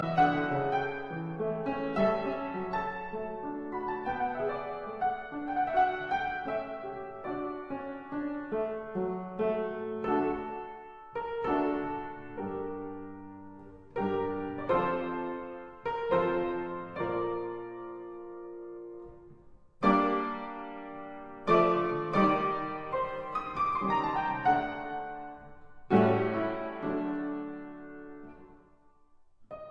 Keyboard Grande Sonata in C major, Intermezzo Andante